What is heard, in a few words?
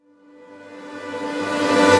Reverse Synths Gmaj; Vengeance - Essential Clubsounds Vol.4